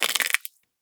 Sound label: Smush